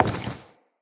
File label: Walk Wood, Footsteps; Characters; Enemy; LadyDeora